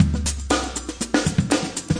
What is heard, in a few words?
120 triple kick beat; 111 to 120 bpm